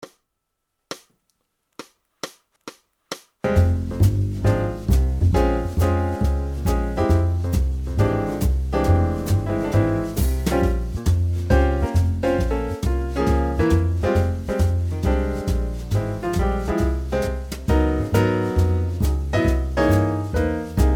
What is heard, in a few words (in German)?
Moll Blues Playalong